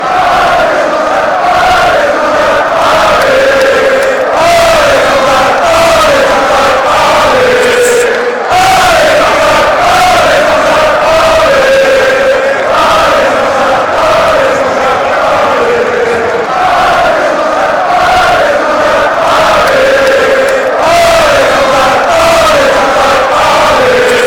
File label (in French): allez, chants